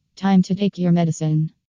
Medication Reminder

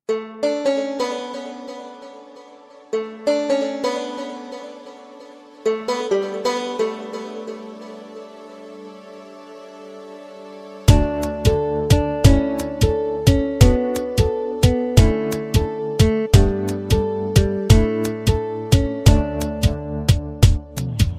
Sweet Music

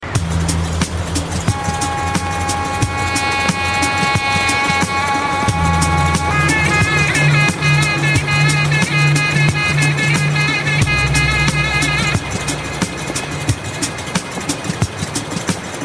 Zurna

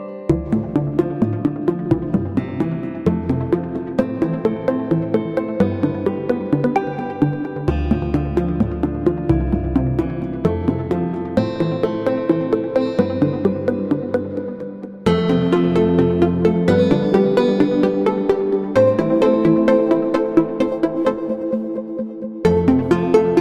Music Box

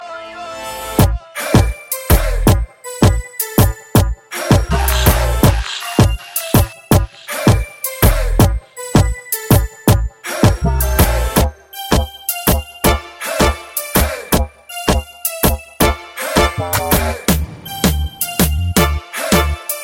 Latina

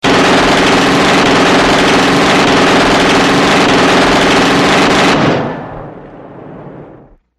9mm Automatic

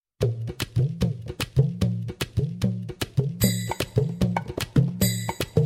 Funny Music

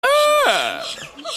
Uuuuuhhhh

